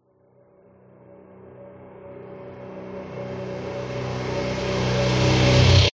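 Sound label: sound design cinematic reversed build tension medium crescendo; zapsplat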